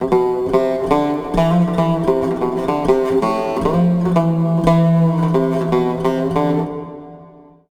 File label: SIERRA GUI